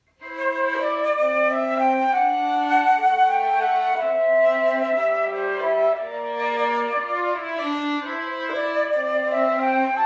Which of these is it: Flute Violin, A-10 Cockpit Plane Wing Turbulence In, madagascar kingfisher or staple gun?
Flute Violin